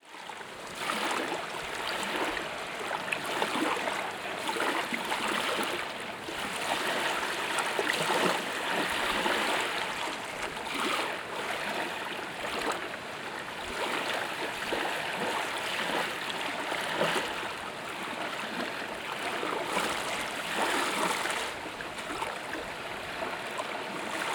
Fort McAllister Water Lapping Boat Ramp, Savannah, GA Ambiences; TS Sound